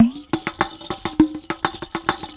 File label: Tamborine; Ambient Grooves; World Groove